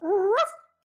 Wooroof

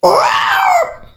T-Rex Noise

